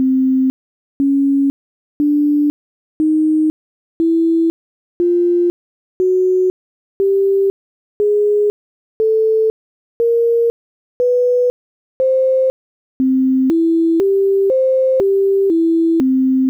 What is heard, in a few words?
scales